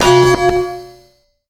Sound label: Pipe Large; Prison Architect